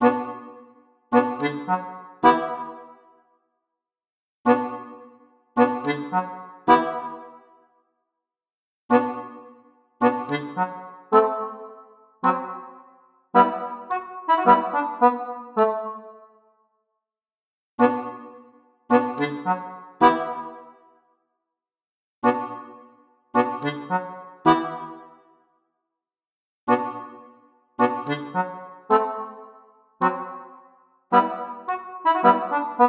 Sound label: Quiet and nostalgic accordion solo